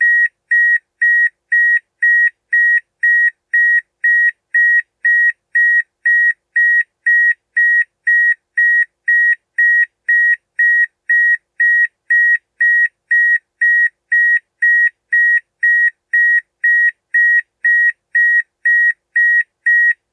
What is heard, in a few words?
key alarm, car